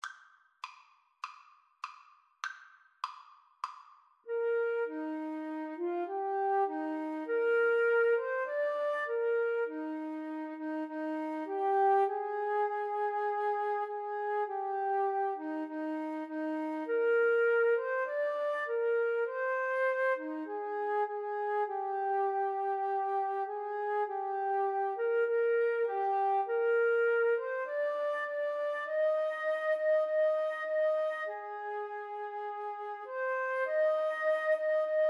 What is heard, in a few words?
auld lang syne, Flute Duet